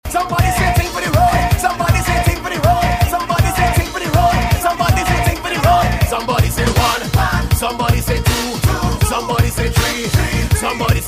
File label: soca ringtone